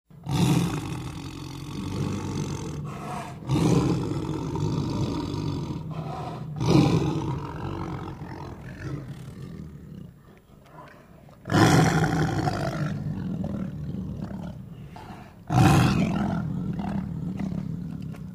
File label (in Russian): tigr golos